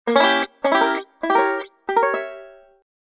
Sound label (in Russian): nelovkaja sitacija bandzho